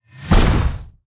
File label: SFX Fight Magic Ball; mighty party 2017 gamerip pc ios android